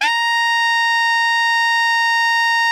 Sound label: alto FF A#4, saxophones; Greytsounds; Philip Wolfe David Crozier's Saxophones